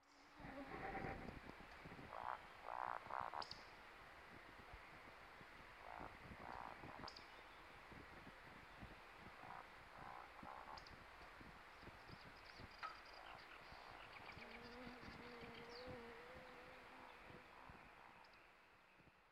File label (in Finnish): lehtokurppa; kurnuttaja; hannes tiira